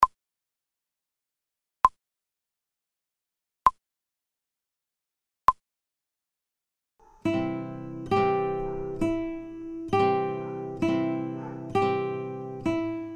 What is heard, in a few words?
See Saw Study slow, guitar; without metronome